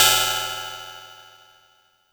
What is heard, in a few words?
Cymbal FX